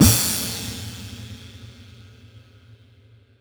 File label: Epic Crash, Drum Hits; Zero-G; Soundclash